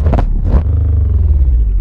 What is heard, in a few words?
v8 stop; golf